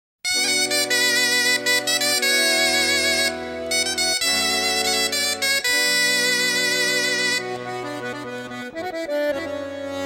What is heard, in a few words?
Gavotte Bal